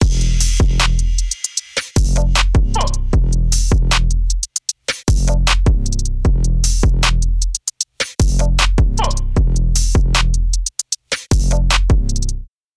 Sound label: Drums Aggressive After